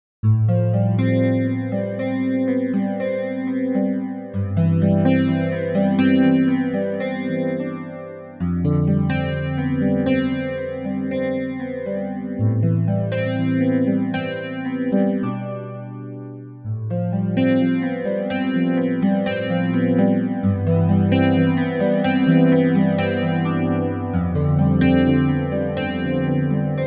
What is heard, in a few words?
Steel Guitar; VIVO S10